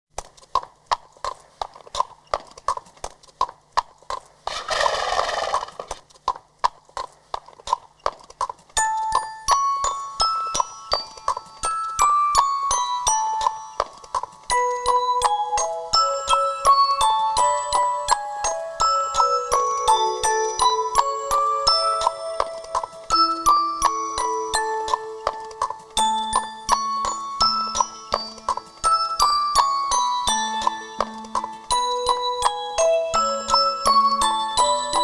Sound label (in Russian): loshadi begut obemnij 3d zvuk